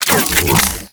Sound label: Briefcase Katana Unequip; Warframe; Corpus